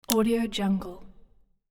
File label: loading bullet; hollywoodedge